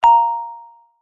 multimedia notification bell chime tone vibraphone